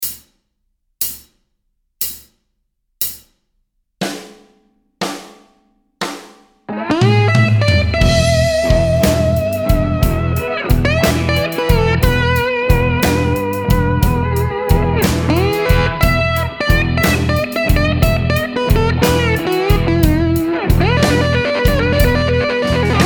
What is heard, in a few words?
slide mix, guitar